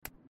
clicking game menu sound; Life is Strange 2; gamesoundopedia

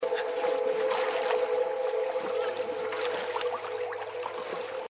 death; inside

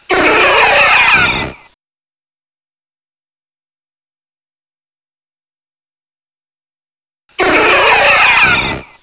mewtwo cry new gen; pokemon x y

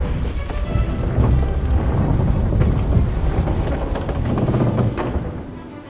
stone door open; ori and the blind forest

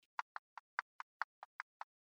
steps; Subway Surfers

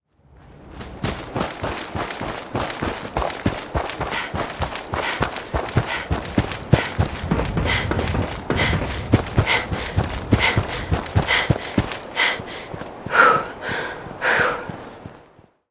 running; fallout 4

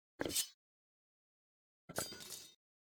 pick up and drop sword; baldursgate

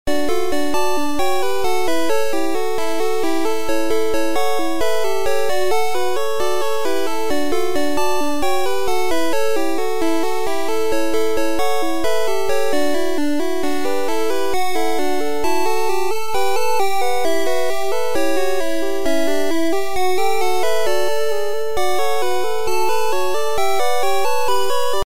pokemon center; Pokemon Red Blue; gamesoundopedia

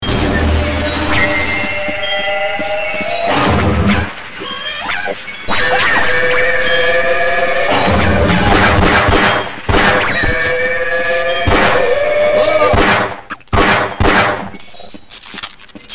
hound; half-life

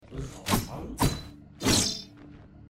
strike; risk of rain 2; merc

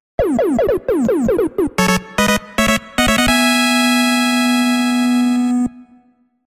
mmsoundtrack; Mega Man 2; gamesoundopedia

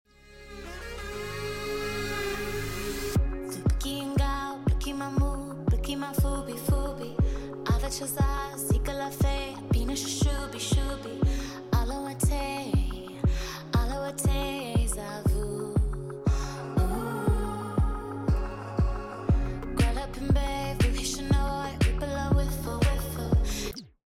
simlish music; sims 4

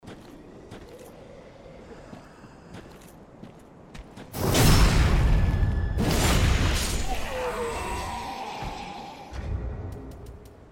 backstab; Dark Souls III

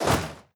tab military; europa universalis iv

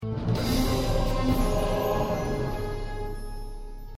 quest completed; The Witcher

